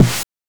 BANK1 SD, NES DPCM; MIDINES

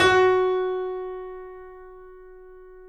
F#, The Piano; InVision Interactive - The Piano

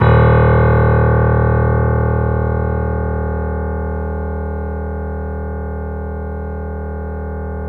D#, Piano; InVision Interactive; The Piano

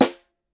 SN, Percussion

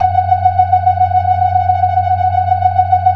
42 BLOOD R 1, ORGAN; Keith Emerson Signature Series

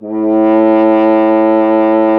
F. Horn; Miroslav Vitous; Solo Instruments VOL-2

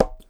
D&B Conga; Drum & Bass Kit; essential drumkit

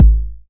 909 BD; Goldbaby Tape808 & Tape909; The Tape 909

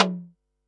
tom, Pearl SC-40 Toms

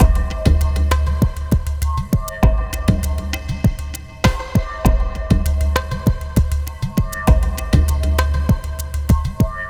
Ethnotronic, Processed Percussion

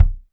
Kick, Alesis DM5

